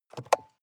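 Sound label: sports car interior compartment open medium; verkehr transport; autos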